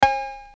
Cow Cassette

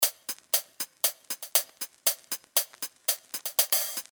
Live Hats

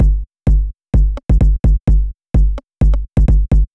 Rim Shot

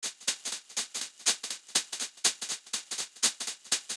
Percussion Loop

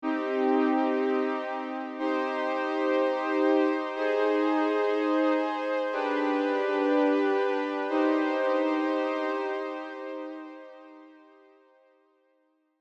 Legato Pad

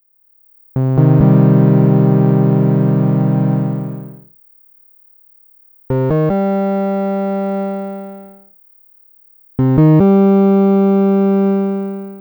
oscillator options; Volca Bass

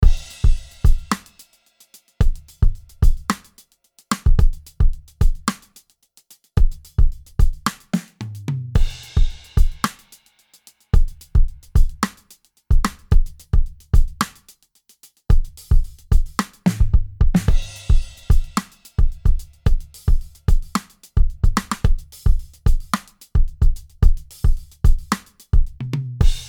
neo soul

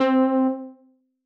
Layered C3

